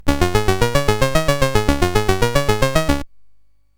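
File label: Moon Patrol, MOS 8580 SID chip music, games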